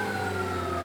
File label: decelerate, vehicle